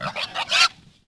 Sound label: Pig Grunt; Civ4; Resouces and Improvements